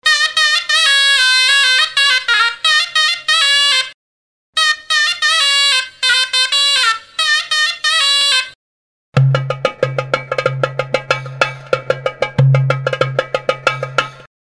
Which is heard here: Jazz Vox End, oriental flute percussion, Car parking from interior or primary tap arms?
oriental flute percussion